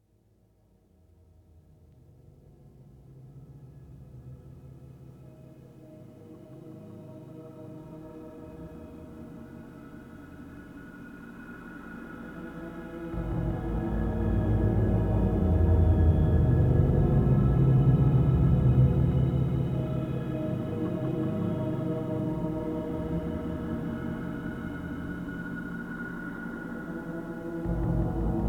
Fringe Area is an analogue tape loop and shortwave; Wave Farm